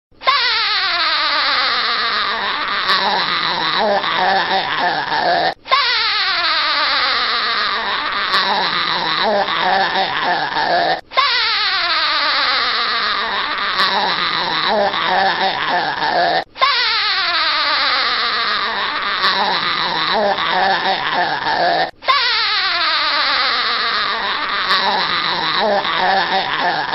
laugh fun; children; spongebob

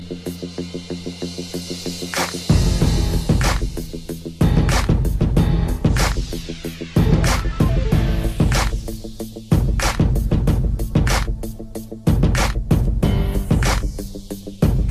black beat, hip hop

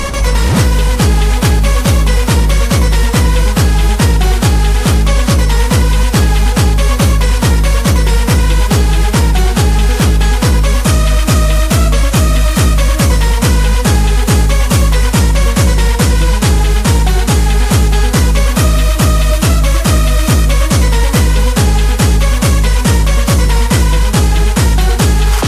Rock That Beat

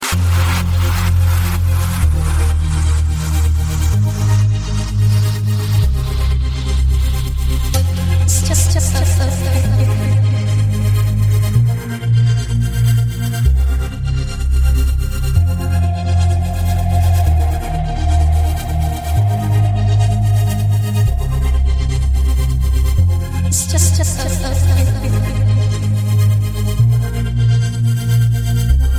Trance Ringtone

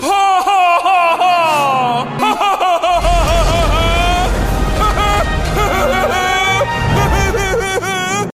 PC Laugh

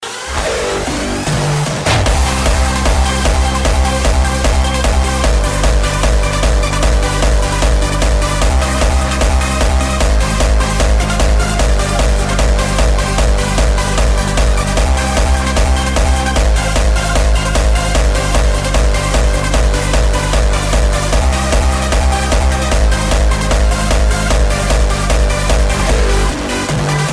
happy hardstyle, ringtone

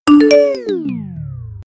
IPHONE BAT LOW

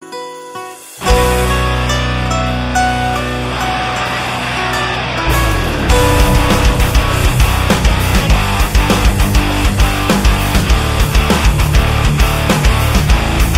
Metal

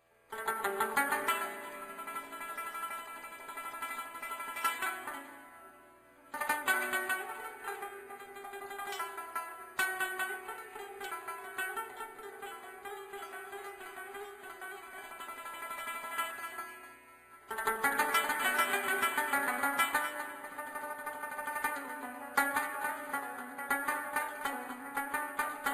Rabab Fusion

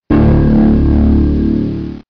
Low Tone

